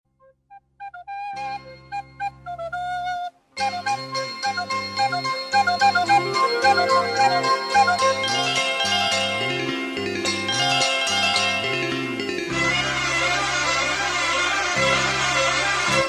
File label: melody ringtone tamil ringtones; Roja movie